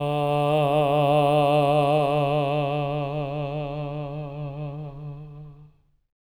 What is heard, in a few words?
TENOR D3; Spectrasonics; Symphony of Voices